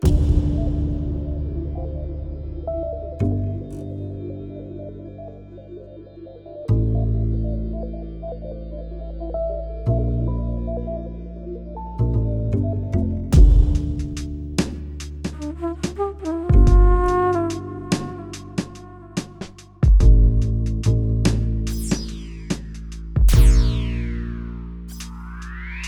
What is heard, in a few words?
Jazz